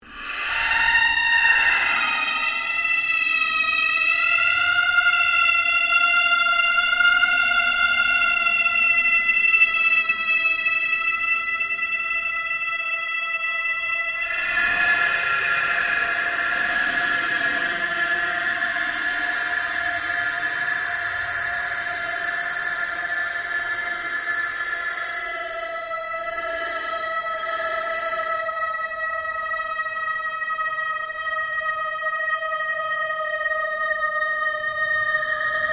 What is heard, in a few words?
Ambient Drone Ghost Chatter Scream; Sound effects created by manipulating other sounds; Scary Soundscape Sound Effects